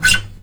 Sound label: STL SCRAPE, Synclavier, GUITARS; Synclavier Sampler Library